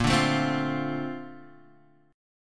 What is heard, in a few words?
A sharp Major 9th, Guitar, DADGAD